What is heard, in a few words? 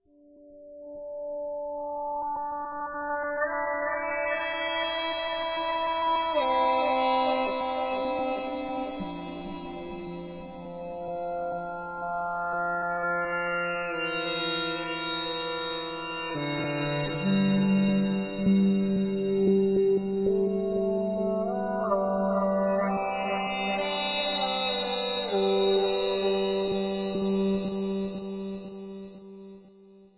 guitar flower pads; GUITARS